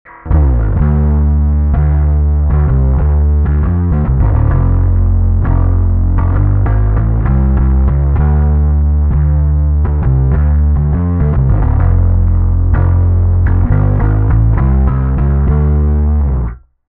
mix overdrive all bassboost; Omega

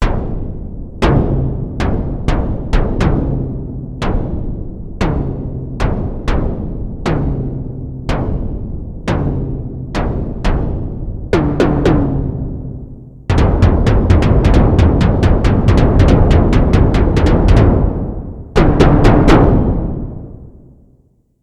Sequential; Sequential Take5

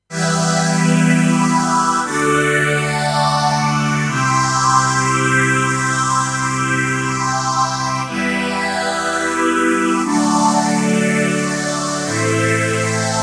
Vintage String Ensemble, tc electronic Helix

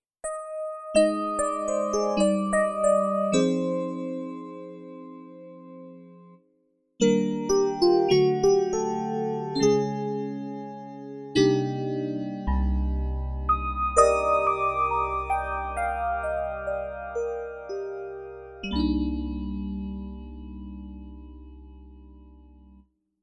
CA67 Modern EPiano

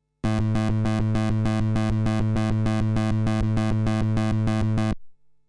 Warm Audio TB12 Moog vintage clean x731 steel

